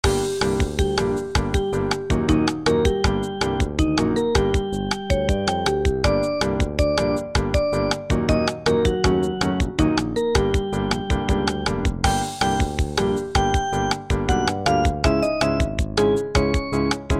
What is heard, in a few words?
Elevator Music